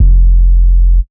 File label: bass 808 pumper F; Polyend; ARC Noise